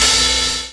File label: OH RUST, HHT; E-Lab; X Static Goldmine 2; akai